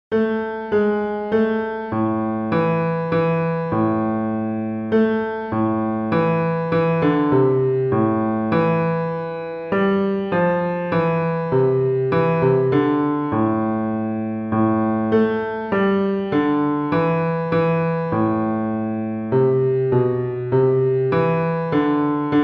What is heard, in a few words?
Bass